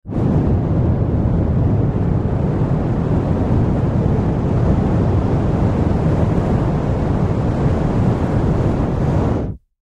Sci Fi Jet Pack, Sci Fi Jet Pack Rocket Flame Thrower On Run Off Fireball; Battle